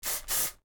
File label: Deodorant spray twice short